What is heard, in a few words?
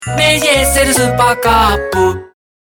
sound logo